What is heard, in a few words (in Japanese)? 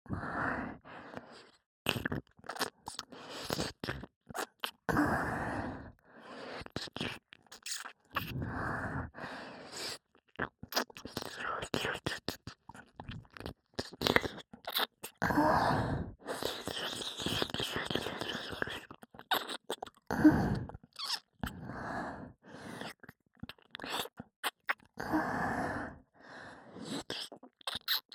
左耳舐めループ